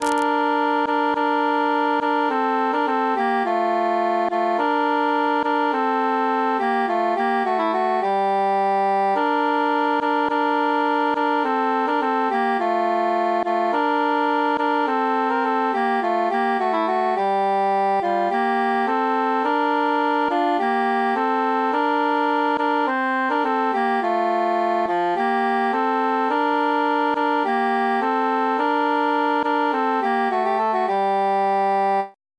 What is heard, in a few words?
bassus mute; pastime with good company